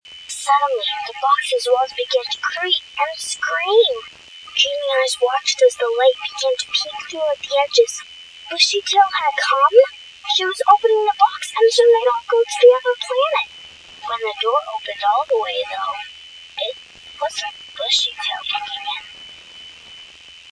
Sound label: the door opened